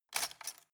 cutlery plastic drawer grab hard

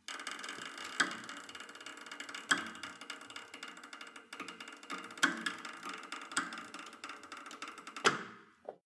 cable machine creak down

